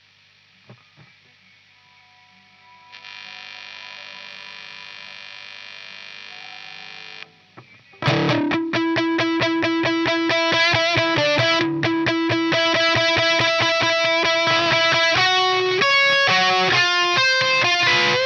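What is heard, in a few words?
guitar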